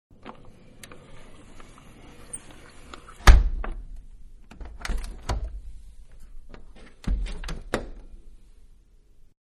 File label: Window Crank Close; Windows; Household; Window Crank Type-Closing; Manhattan Production Music